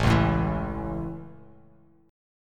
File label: A Major, Bass Standard; strummed